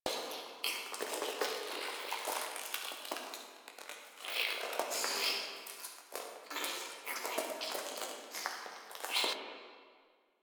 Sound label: Interior Critter Loop; Warframe; Infested Eris; Ambience